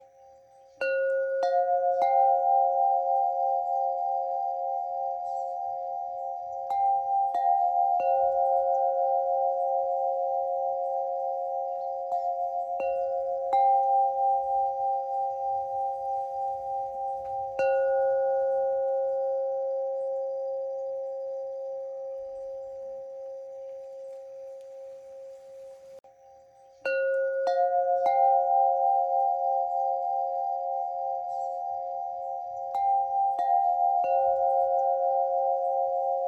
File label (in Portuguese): Triade SuS